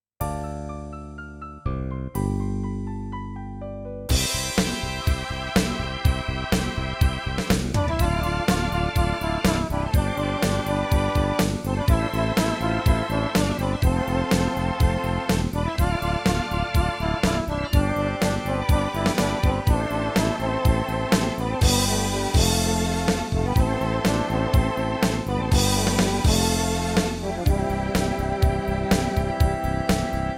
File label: SC880